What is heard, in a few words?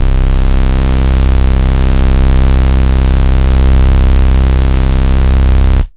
huge tone synth